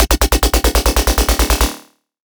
Bass F# Stabbing, Dubstep; Bass One Shots; Stabbing1; Ghosthack; Dubstep Sound Arsenal; 50 Bass One Shots

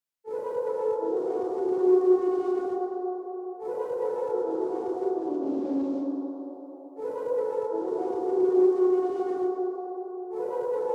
143 BPM B Min Lead; Cymatics; 2022 Melody USB Expansion; Charge

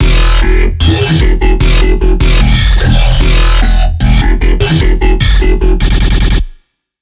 150 Techish, Dubstep Bass Loops

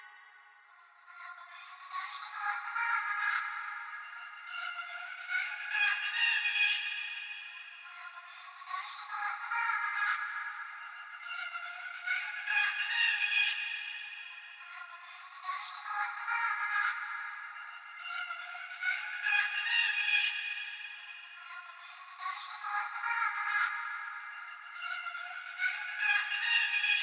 142 BPM D# Min Vocals; Cymatics; Can't Die

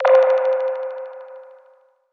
SYNTH (C); One Shots; Shatter; Cymatics; Mystery Pack Vol 4

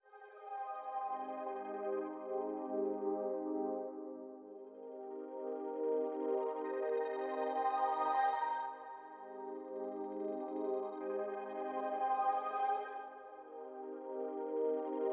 110 BPM C Min FX, RnB; Cymatics; Seduction; 2022 Melody Collection; RnB Pt 2

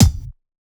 Bassdrum, dubstep and trap

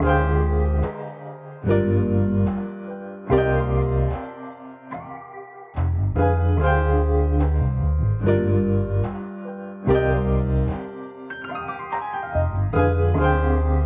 146 BPM C Min; Cymatics; Zodiac III Beta Pack; Zodiac III Production Suite

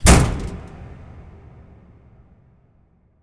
impact hatch; Hits, Impacts

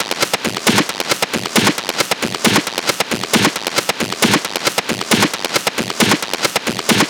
Perc Loop 135, Techno

